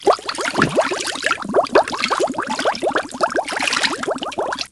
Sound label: slime pit